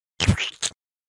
Crushing tomatoes; له شدن گوجه فرنگی

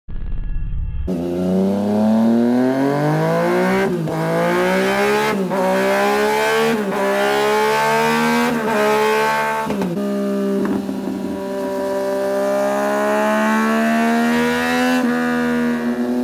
Racing exhaust

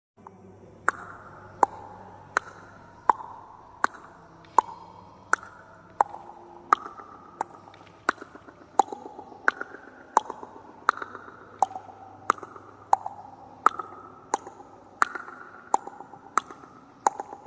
reverberation stone space; عمق ریورب خفیف

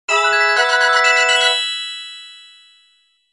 ringtone; Sony Ericsson

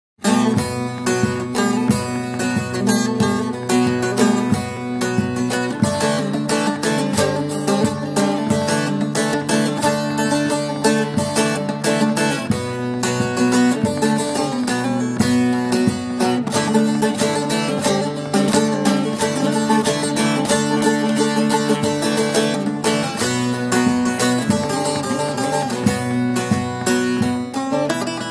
padok

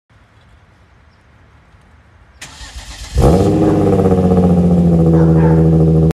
Genesis coupe

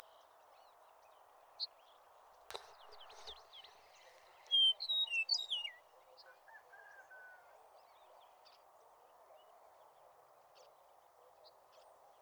Eastern meadowlark

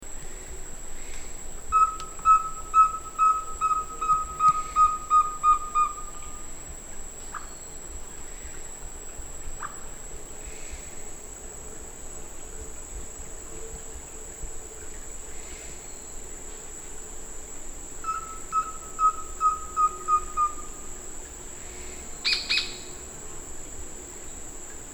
Rusty belted tapaculo

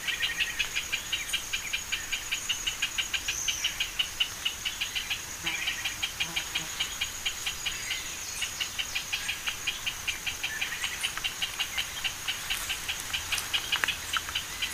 Pinto's spinetail

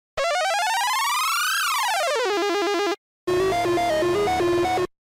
Score points in game, امتیاز گرفتن در بازی

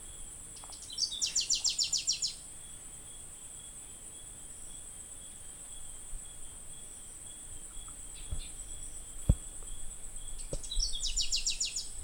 Variable sunbird

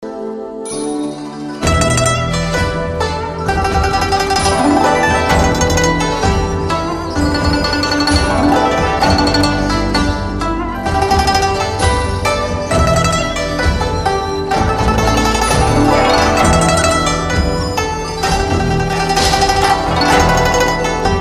Guqin; گوچین

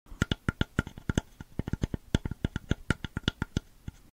Nail on box; ناخن روی جعبه

